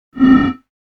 Oberon-Bottle

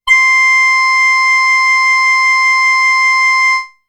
Oboe C

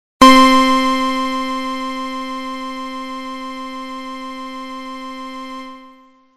Three Pulses, synthlead

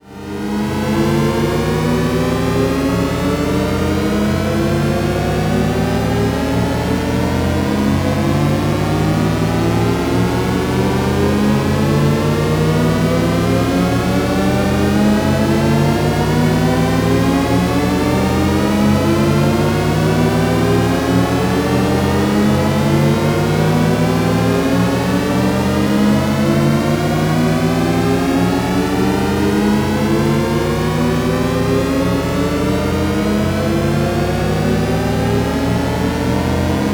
Shepard mid Pulse Rev; experimental

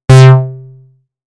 Bass, synthlead

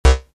Triton, synth lead

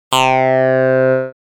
Jaw, synth lead

